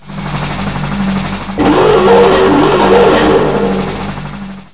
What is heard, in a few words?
futurechop, ambience